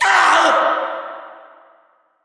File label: scream